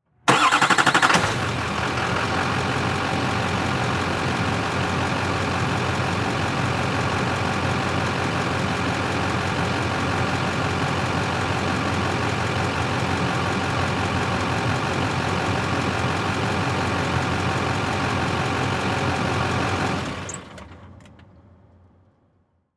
Transportation Truck Diesel Truck Start Idle Off Mic In Front Distant